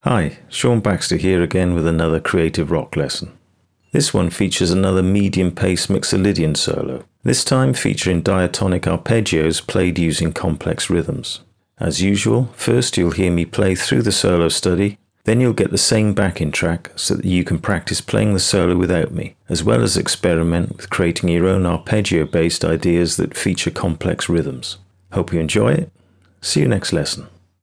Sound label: Creative Rock intro, Guitar; Guitar Techniques 2019 Issue 296 June